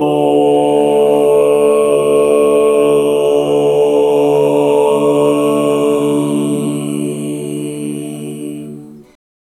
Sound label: monks tantric tibet, chant; gyuto